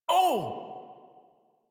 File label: Pain, Barks, Battle; Warframe; Baro Defense